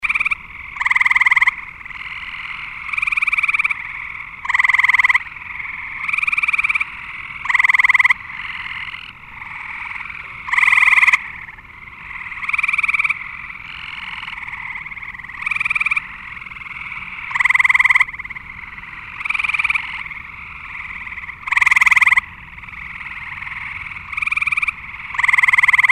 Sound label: Eastern Gray Tree Frog; Wildlife